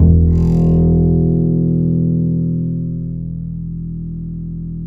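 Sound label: double bass E; Univers Sons; Jazzistic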